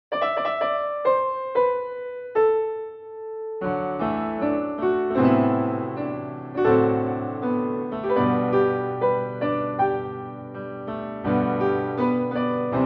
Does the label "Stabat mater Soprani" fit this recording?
No